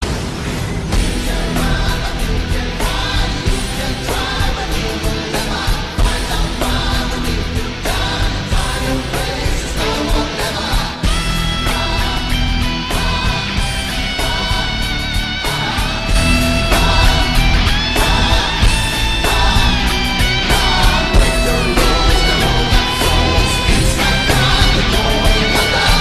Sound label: Dashing Tone